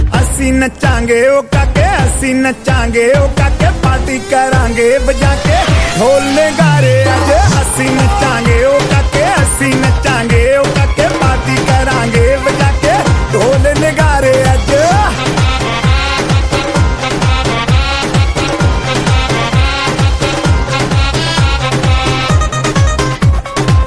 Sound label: Dhol Nagade Punjabi Ringtone; ringtones